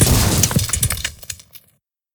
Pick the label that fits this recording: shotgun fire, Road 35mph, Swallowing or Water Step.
shotgun fire